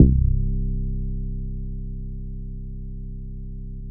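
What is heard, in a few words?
BASS, right; East West - Phat & Phunky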